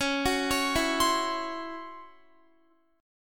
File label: Db Minor Major 7th Double Flat 5th Db down Guitar; arpeggiated; 4E,4A,5D,5G,5C,6F; DbmM7bb5